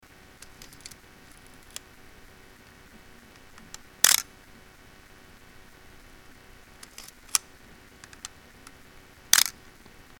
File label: spotmatic, appareil reflex; Asahi Pentax Spotmatic; Le Spotmatic est un appareil reflex 24x36mm. Sorti en 1964, le Spotmatic innove par sa cellule couplée intégrée au boîtier, et qui effectue la mesure de lumière directeme